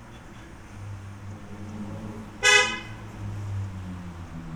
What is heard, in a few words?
Car; Concerned Watchful